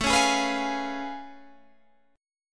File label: A Augmented Major 9th A down Guitar Half Step Down